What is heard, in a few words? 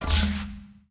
panorama custom lobby dialog; ui